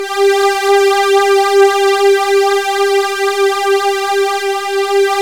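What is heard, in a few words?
PAD, synth; AMG - Now CD-ROM (Roland); Old Gold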